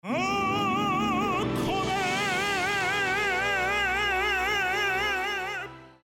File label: bgm